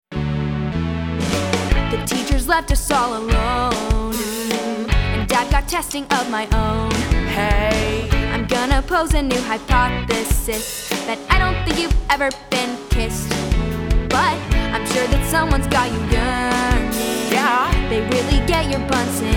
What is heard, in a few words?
Guide Vocals; Audition Cut